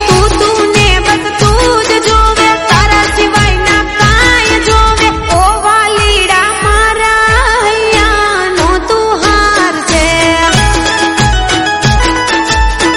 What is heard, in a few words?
song gujrati